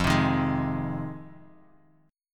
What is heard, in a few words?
F Minor 7th F; JGuitar